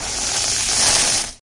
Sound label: fuse burning